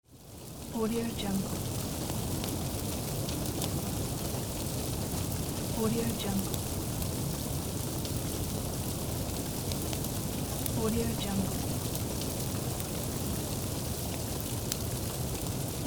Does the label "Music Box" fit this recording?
No